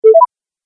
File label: Sine Wave Confirmation, beep